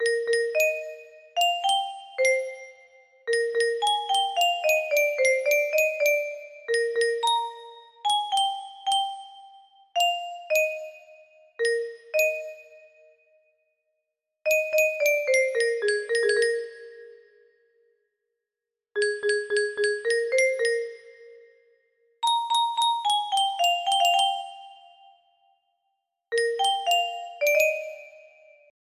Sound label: musicbox